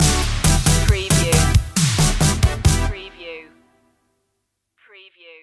laser ninja stinger

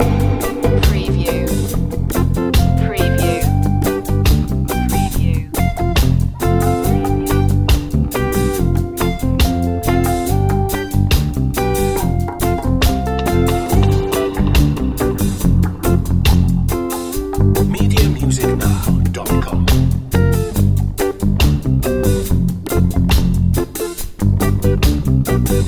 summer reggae jam loop

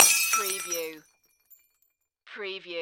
Glass Break Wine

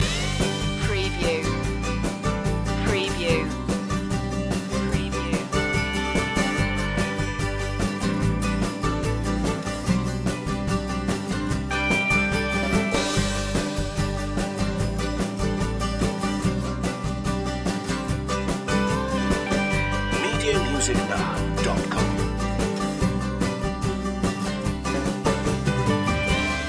mandolin road loop

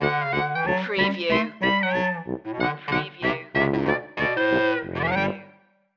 wildcard sally stinger

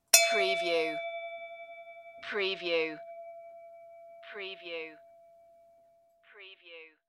Bell Ring Single

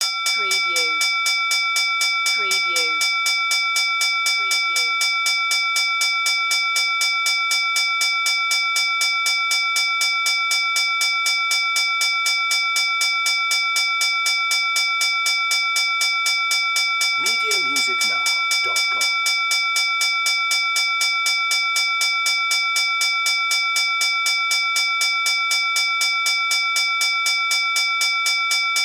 Train Crossing Bell

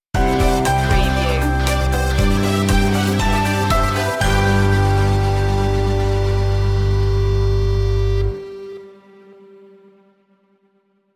flying high stinger

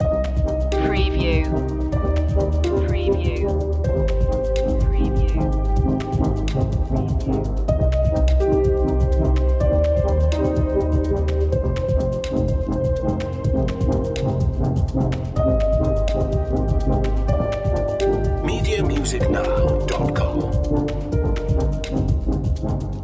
erased loop

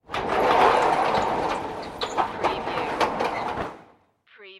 Warehouse Door Close; Sound Effects Bible

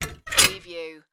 Vent Open Metal; Sound Effects Bible

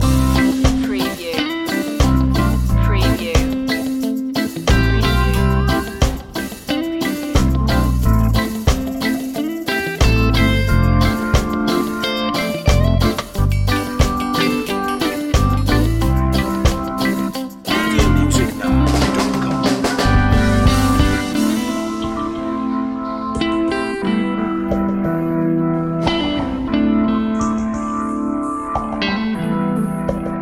rock steady loop

